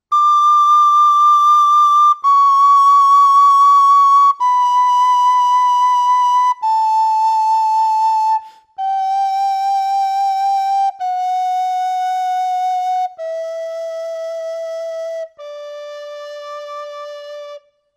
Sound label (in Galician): vibra dedo, Pito Galego; afinacion